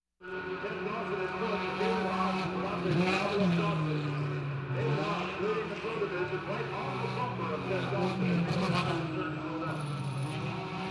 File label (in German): Sport Grand Prix Motodrom Atmospaere